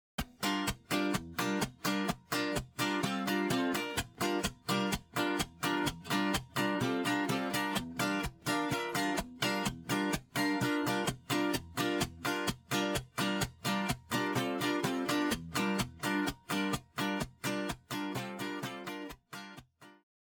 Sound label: Ac Gtr Tuned